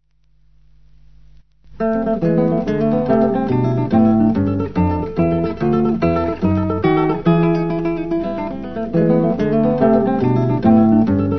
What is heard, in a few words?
romantic, guitar